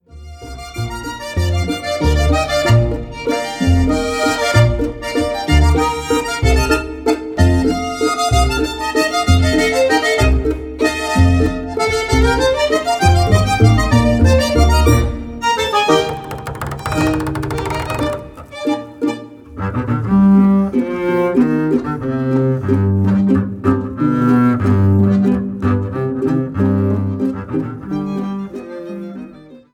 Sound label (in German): landler